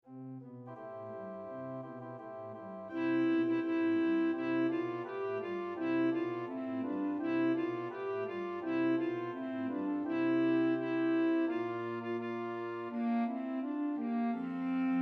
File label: Fanfare Gloria in excelsis Deo Tenor